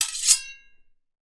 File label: swords x 2 hit scrape